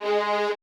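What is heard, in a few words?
Vibraphone F#G2 R, AKAI Advance Orchestra; AKAI Advance Orchestra Upgrade 97 Vol.1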